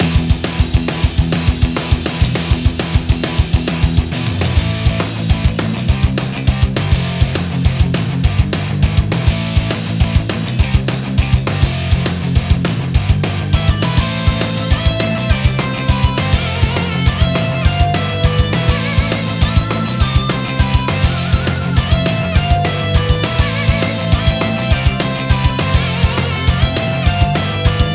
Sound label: race song loop